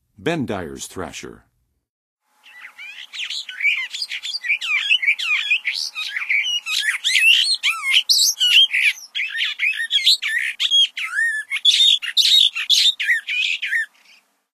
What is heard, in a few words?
Bendires Thrasher, Songs of Birds; North America; Western USA; Western USA Songbirds